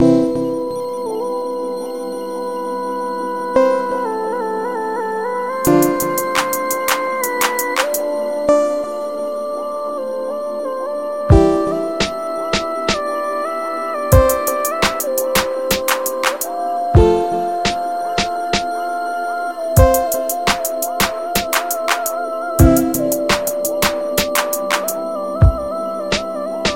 flauta ambiente 85bp

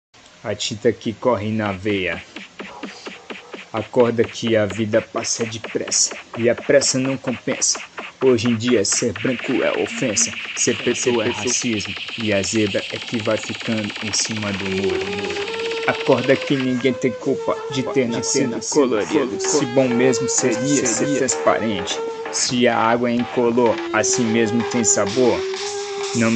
saxofonista

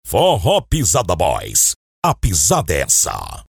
vieta forro

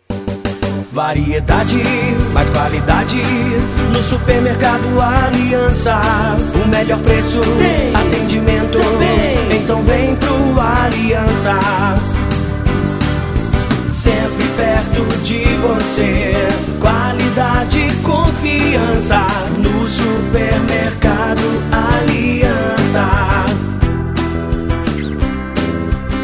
jingle supermercado

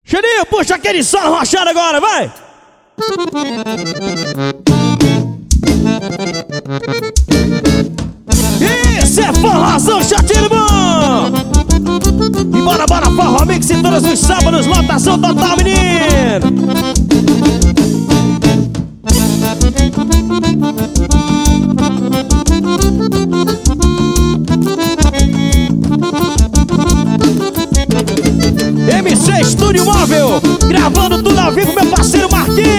forro xote

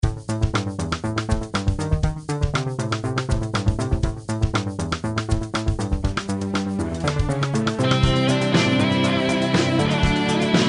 vinheta abertura de tv

